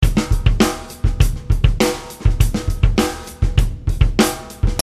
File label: Hip Gnop